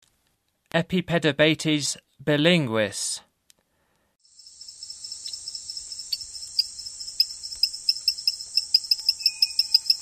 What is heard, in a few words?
Epipedobates bilinguis, Frogs of Ecuadorian Amazon; S. America